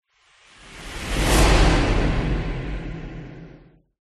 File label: whoosh; orlando lanzini